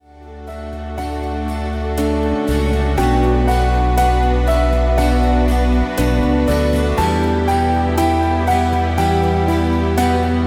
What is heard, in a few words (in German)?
Entspannungsmusik mit Klavier